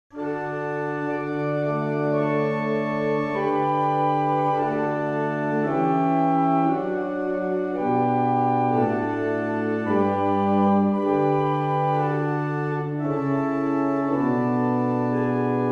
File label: barrel organs; Stratfield; Harington